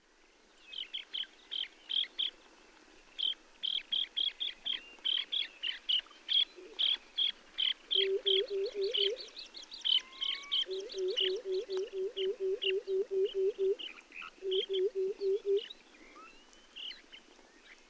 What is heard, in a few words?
Display flight of two males and a female